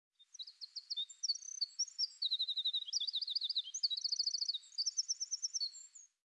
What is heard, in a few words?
ambient; World of Tanks